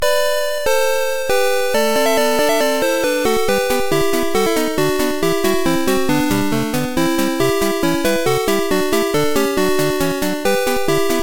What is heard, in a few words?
Theme; GSC; Bicycle